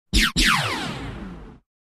Synth Energy Zaps Dbl

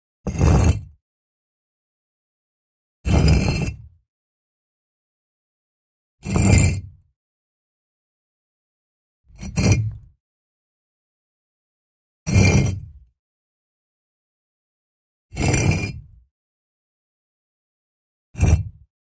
Stone, Heavy Brick Move, Push, Short x7

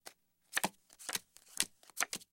Cards Dealt Cards Dea, casino games cards

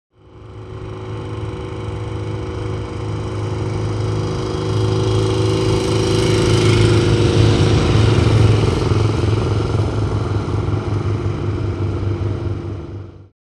In Eight Motorbikes, race

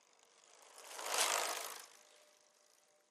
Bicycle Ten Speed Bic

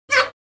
Monkey Spider Chirp S

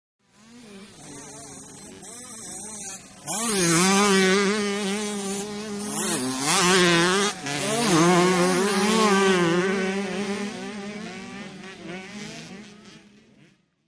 Motorcross Jumps By, motocross

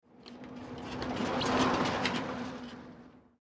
Bicycle Mountain Bik

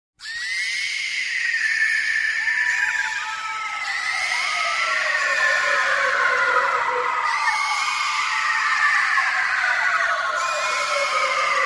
Synth Alien Screams

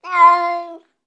Cat Domestic Meow Sad

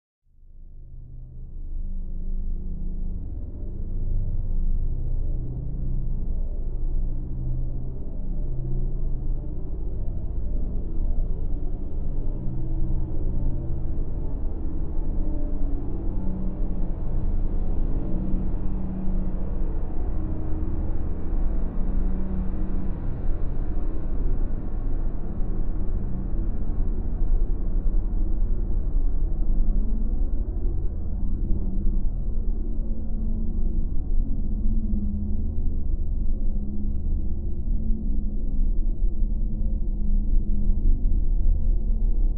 Bed Ascend Terror Lfe

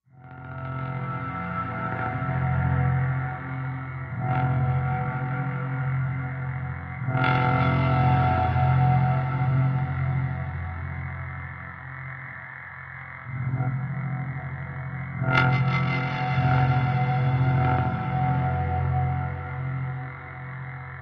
Existential Wait Dist P, eerie ambience

